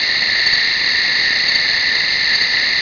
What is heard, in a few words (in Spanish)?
grillos